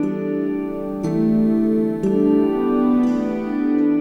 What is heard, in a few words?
Pretty Poink In, Pastoral; Sonic Foundry (Sony Creative Software) - Friendly Fire